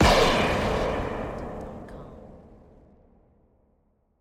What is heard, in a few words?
Sci-Fi Gun Shot; SoundsCrate